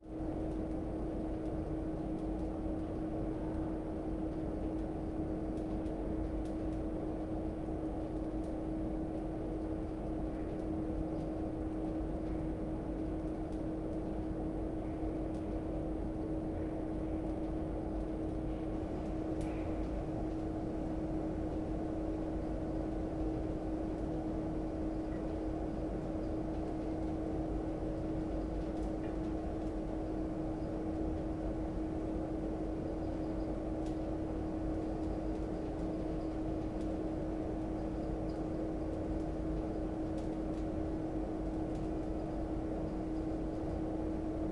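industrial gas boiler room pipes installations room in front near; sonniss gdc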